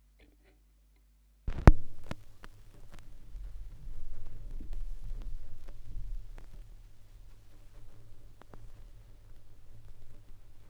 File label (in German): schallplattengeraeusche, Vinyl; wendelrekords 4 06 B